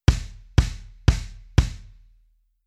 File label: Rhythm Funk BD